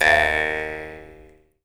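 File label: Jaw Harps, Pitched Percussion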